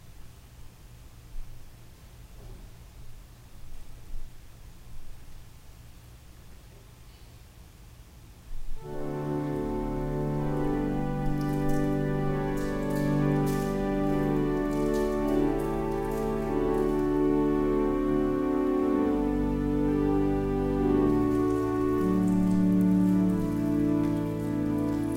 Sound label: Organ Music